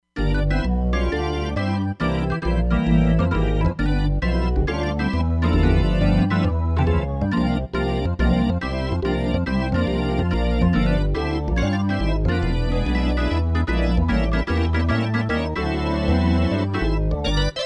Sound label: R&B Organ 2, Roland Fantom XR